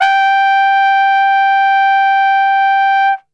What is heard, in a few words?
G 3, Brass; Best Service; Brass Super Section